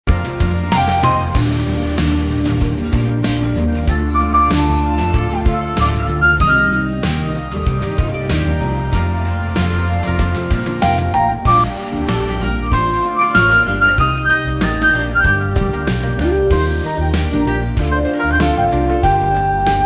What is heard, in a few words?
optimistic melody; AI Test Kitchen